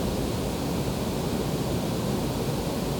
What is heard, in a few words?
Background Noise, Calibration Set, Desktop